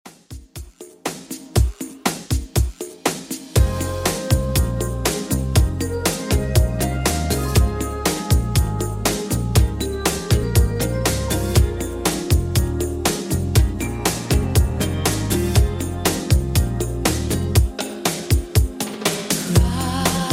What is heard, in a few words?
90s 120bpm; Redrum